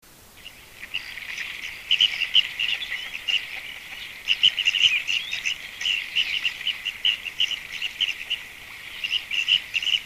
red crested pochard

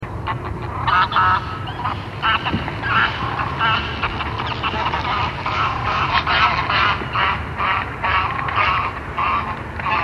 greylag goose

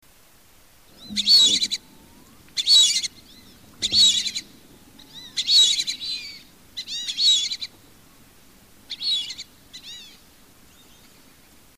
fulvous whistling duck

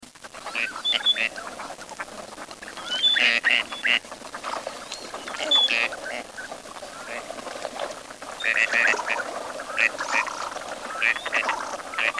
gadwall

